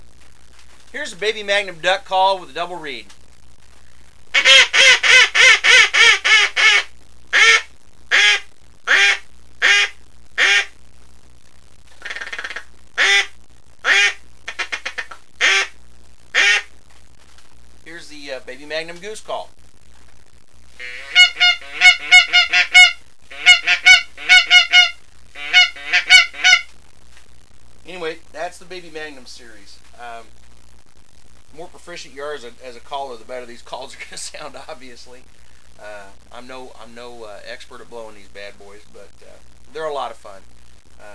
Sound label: new baby, duck sounds